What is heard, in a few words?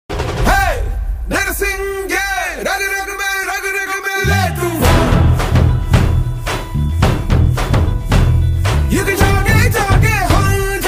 song ringtone; chhaava